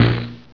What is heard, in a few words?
baddy hit player